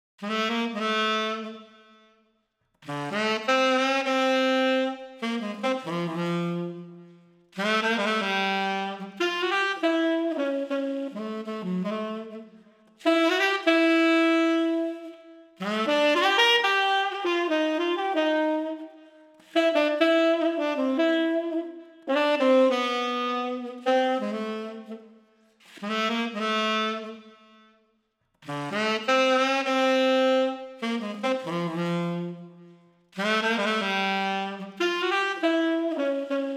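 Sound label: Street Tenor Sax Heartfelt, 75 bpm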